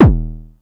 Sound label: diodes